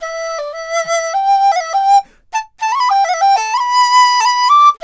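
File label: dizi 100; INIS; Opium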